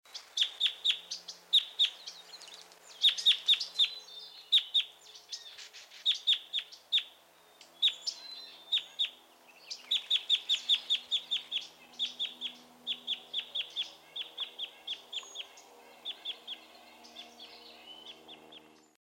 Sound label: Red Crossbill, Loxia curvirostra type 2; finches